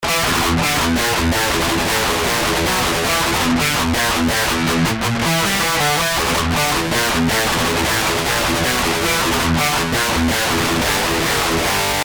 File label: AMP